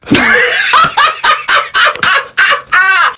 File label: laff; gervais